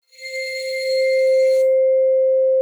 arco C5, vibes; Xsample VOL-4

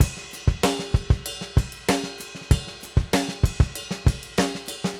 Drums, 70s Analog Funk; Sonic Foundry (Sony Creative Software); What it Is!; Slinky's Place

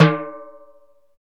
timbales, Latin; Roland L-CD701; PRC Latin 3

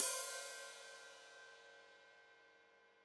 Hat Foot Open, Hi-Hat; Deeply Sampled Drums

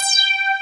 ACIDIC BS; Pro-Rec - Synthsonic; BASSES

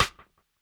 RIM CLICK, electro kit; JUNGLE BEATS

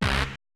perc, Roland MC-909

